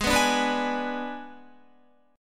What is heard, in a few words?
Ab Diminished Ab Guitar 4F,4A,5C,5G,5C,6E; strummed; 15,14,14,16,14,16